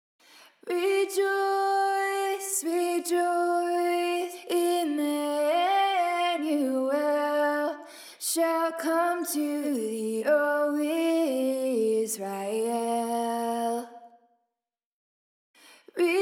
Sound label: Harmonies, choir